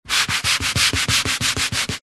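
household paper towel tissue kitchen roll rub clean wood kitchen cupboard door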